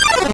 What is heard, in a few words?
combo break